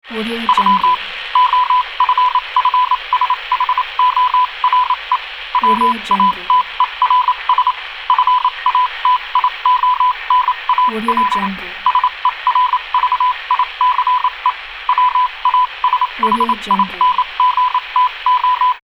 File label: morse code